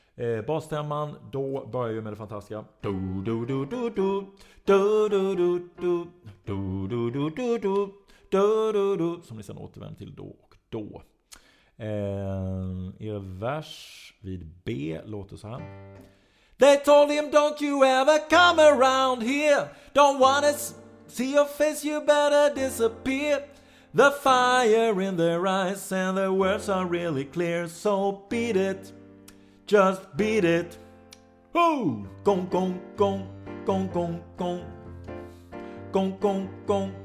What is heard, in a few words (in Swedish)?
Bas